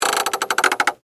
Lever Crank Long, Foley, Lever, Crank, Long; Hand Tools; BlastWave

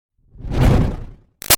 Whoosh Power Pulse Strong; Sound Design; Whooshes; Big Room Sound

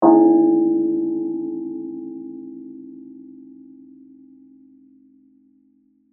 Church Bell Ring, Music, Church, Bell, Ring; Bells (Sound Effects:Musical)

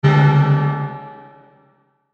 METALLIC DARK, Metallic Dark Swell Reverb; Metallic Sound; Metallic Sound Sound Effects; Production Element Imaging Element Accent Transition

